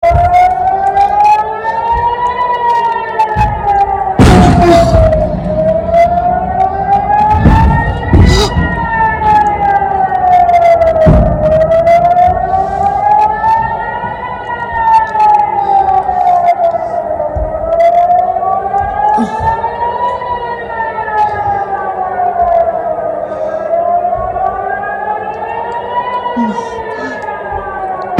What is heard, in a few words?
rockets